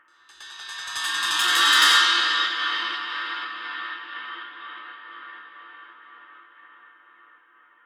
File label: cymbal fx; Tears